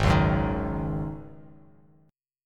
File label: G sharp 7th Suspended 4th, Bass Standard; G#7sus4 strummed; JGuitar